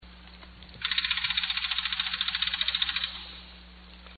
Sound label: jack hammer